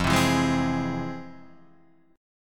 F Minor Major 7th, chord

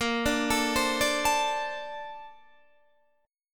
Bb Major 9th, Bb, down, Guitar, DADGAD

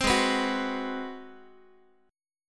G sharp 7th Sharp 9th, Guitar; G#7#9; 15,16,14,16,x,x; strummed

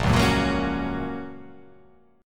A sharp Minor Sharp 5th, down

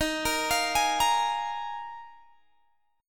Eb Suspended 2nd Suspended 4th, Guitar, Open G

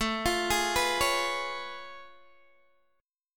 A Augmented Major 9th A down Guitar DADGAD

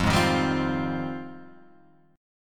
F Minor 9th F

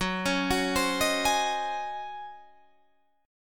Db Major 7th Suspended 2nd Suspended 4th Gb

